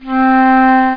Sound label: flute so, winds; Easy Personal Web Page